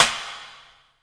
A#3 TICK, JAPANI PERC; Northstar; The Wizard VOL-1

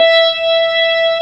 org E5, Organ B3 Hammond

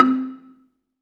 BELA2 C3, BELAFON, Asian Traditions; USB Soundscan vol.60; AKAI

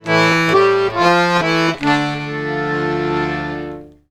140, Complete Accordions; USB Soundscan vol.40; AKAI

